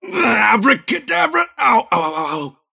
cast pain; dota; kez

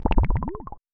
trickling fear end; weapons; dota; nightstalker

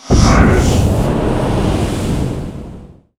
global silence cast; weapons; dota; silencer

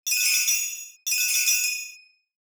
bells; ui; stingers; frogling

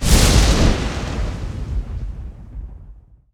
arc lightning; zuus

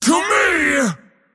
move pain; dota; mars

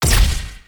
melee impacts; terrorblade

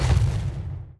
run; earthshaker

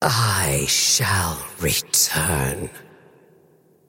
wolf death; dota; crystalmaiden

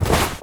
robes pickup; ui; inventory

